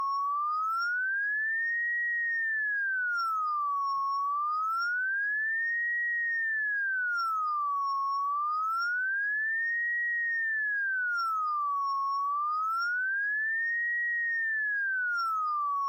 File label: ramps from 1100Hz to 1900Hz